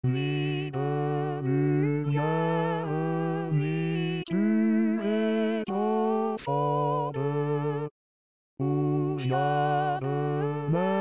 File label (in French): nuit de lumiere h voix